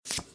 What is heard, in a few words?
card single flip; American Poker V; wazdan